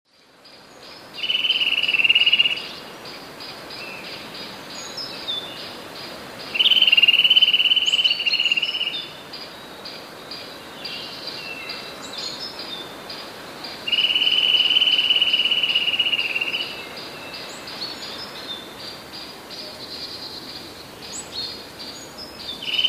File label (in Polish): kowalik najszybsze tryle; Niektóre tryle w tym przykładzie są długie, inne nieco krótsze. Niektóre gwizdy w trylach też nieznacznie różnią się wysokością